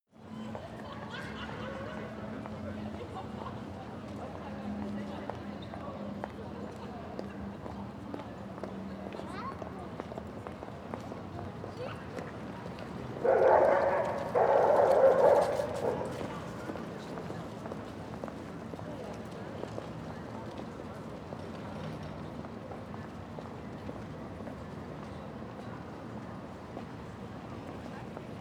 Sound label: calm park people dog